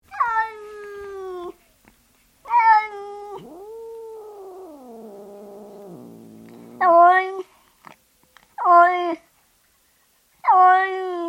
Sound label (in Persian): صدای شیون و ناله گربه; انواع صدای گربه و معنای آن